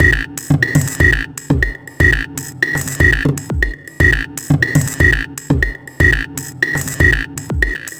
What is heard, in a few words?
Psychic Beat; Sonic Foundry (Sony Creative Software); Loop Noir; Session 08 - Psychic Investigator